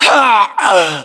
death; english

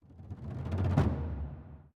music interactive; World of Tanks

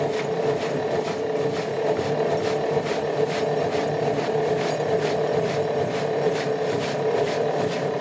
turret, tanks; World of Tanks

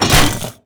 connect latch

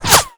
Projectile Sounds; Red Orchestra Ostfront 41-45

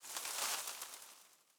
leaf rustle twiggy hard

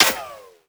impact helmet ric; Escape from Tarkov

